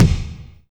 wet bass drum sample g sharp key; kick drum samples

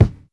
original kick drum single hit c key

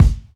clean kickdrum a sharp key; kick drum samples

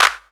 studio clap single shot f sharp key; Duration 0:01; Samplerate 44100 Hz; Bitdepth 24; single hits one shots

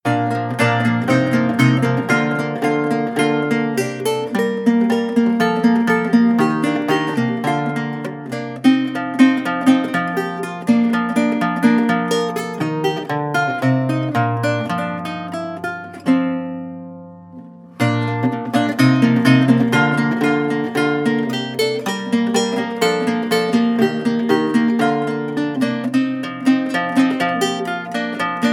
lute 7 renaissance classical sequence; loops

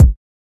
small reverb steel kick drum c sharp key

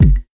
bassy bass drum sample g key

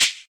clear shaker G key; percussion

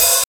good open high hat sample c key

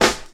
clicky snare sound a sharp key

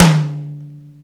big room tome drum sample d key

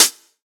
hot foot hi hat sound c key; hat samples

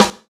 short steel snare drum sample g key

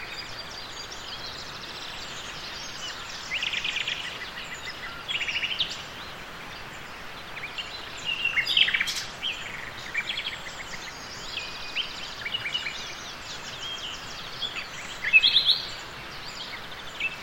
nightingales singing; soundscapes

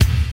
clean kickdrum sample d key

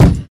smooth kick drum single shot f key; kick drum samples

